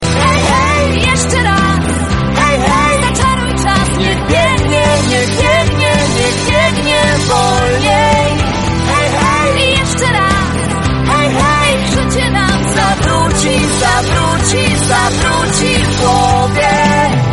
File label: soft